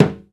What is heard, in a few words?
muted Timbales; E-MU Producer Series Vol. 2 – More Studio Essentials; Composer; Timbales Xswitch